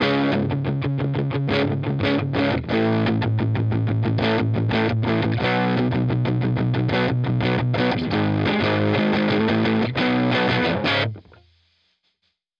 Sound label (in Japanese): ギター